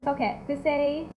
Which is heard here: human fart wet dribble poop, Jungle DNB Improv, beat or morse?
beat